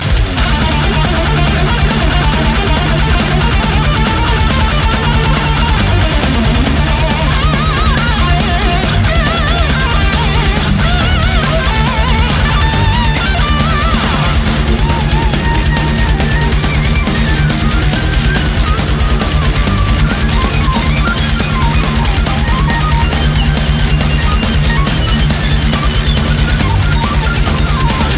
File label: Guitar Solo Metal